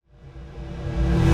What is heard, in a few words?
Reverse Impact; Cymatics; Spirited